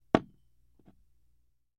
Mug Down On Table; Glass Mug Set Down On Wood With Light Thud. - Glass Set Down; glass clinks